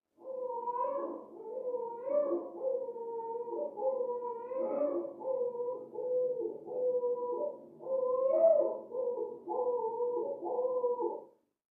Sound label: Baby; Crying, Newborn From Down Hallway.